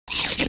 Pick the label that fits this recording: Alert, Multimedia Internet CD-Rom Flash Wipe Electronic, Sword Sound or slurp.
slurp